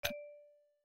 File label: Chip Landing; My Cute Commissar